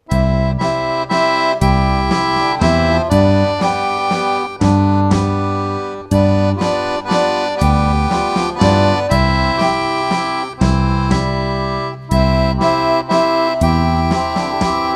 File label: Country